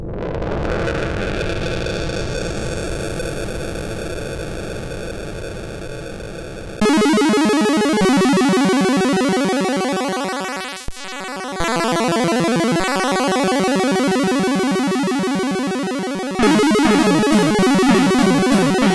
Airplane, MOS6581R3; sid; hvsc

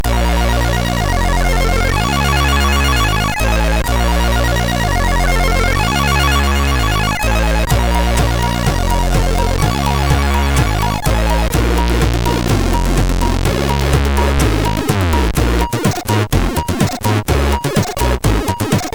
Funky Bass, MOS6581R3

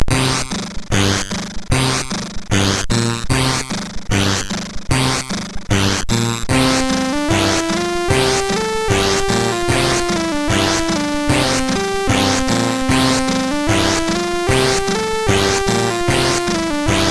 MOS6581R4; hvsc

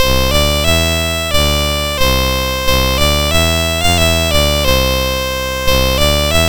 MOS6581R4, GAMES

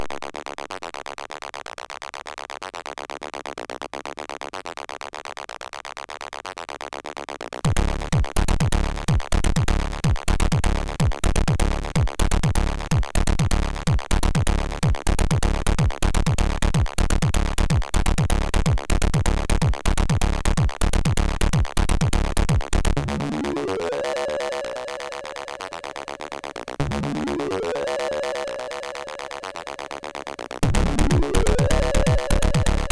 Small Techno, MOS6581

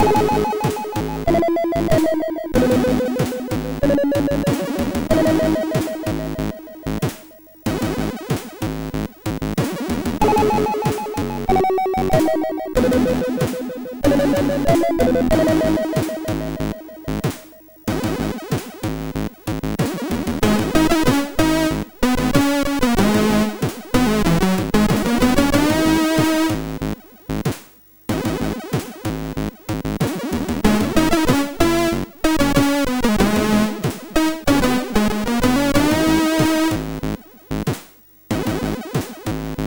Cracking All Night, MOS6581R4

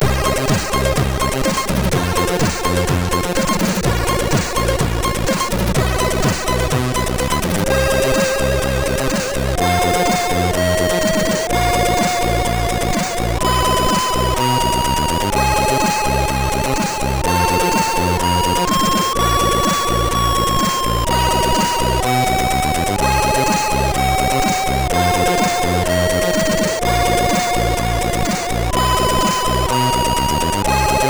Lazer Logo, MOS6581R3

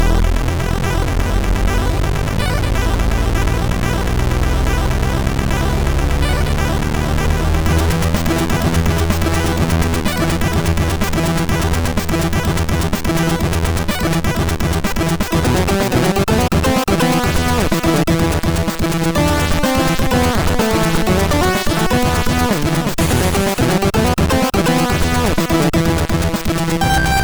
Bleep Bloop, MOS6581R3

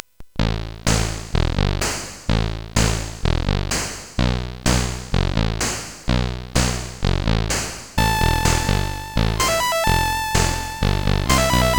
Worse, CSG8580R5; hvsc

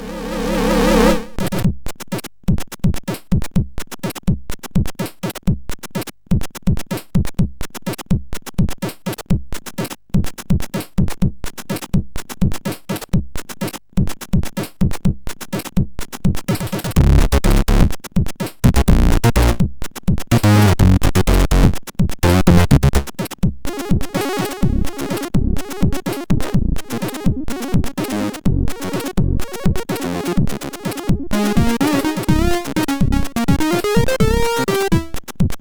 Fat Beats, MOS6581R4

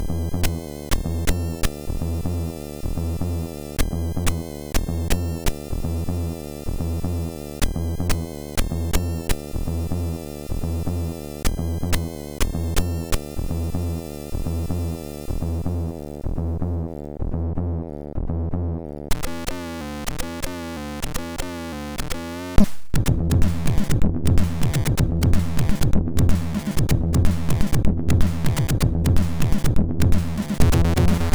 Loop, sid MOS6581

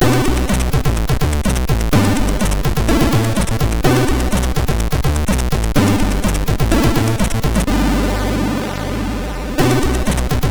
Get Ready Jingle, sid MOS6581R3

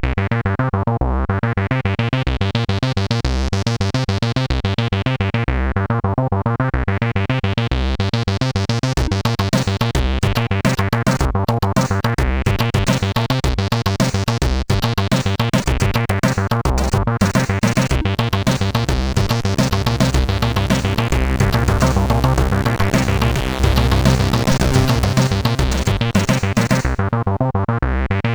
Truck, CSG8580R5; PAL

